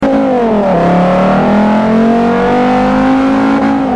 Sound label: second, vehicles